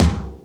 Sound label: Tom Low Rough, Drums